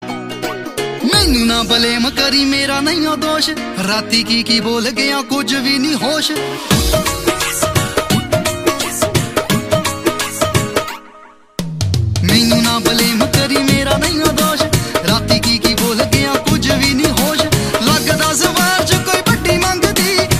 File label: punjabi ringtone